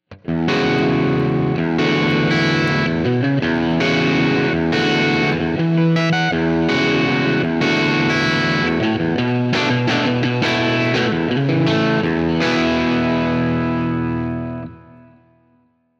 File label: Dirty Rhythm